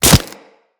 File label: Melee Impact Flesh; Enemies; Kubrow